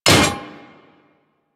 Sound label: Melee Hammer Impact Generic; Warframe; Weapons; Hammers